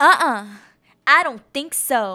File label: Vocal, Infinite Soul; Sonic Foundry (Sony Creative Software); Vocals